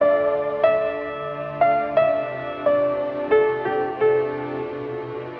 piano and strings, Sad; loops

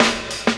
patter, Drum loops, Rolls

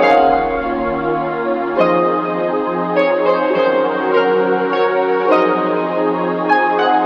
piano and keys, loops, phonky

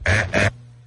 laugh satan heh heh; ooh ahh

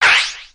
swipe, zaps and beeps

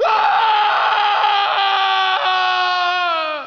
scream male long; ooh ahh

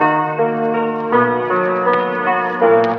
piano, Sad

